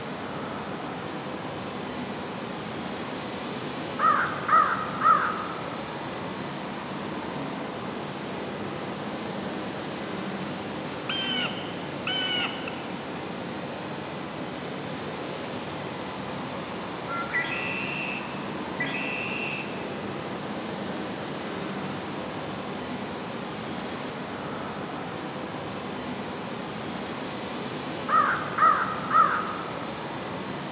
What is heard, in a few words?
Forest Fine; Remington Great American Bird Hunt; wii